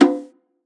Conga Processed; One Shots; Drums; Ableton Live 12 Lite; Core Library

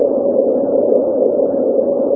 yaw; There; ThereClient; bacio

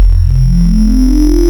Retro Resonant; Ableton Live 12 Lite; Vector; Sprites

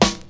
Snare, drums; Ableton Live 12 Lite Core Library

